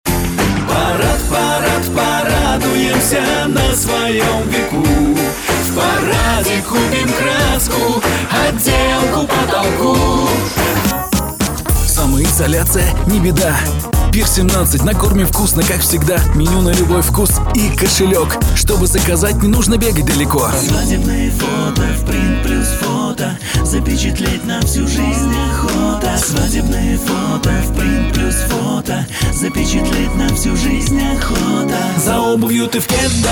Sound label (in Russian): vokal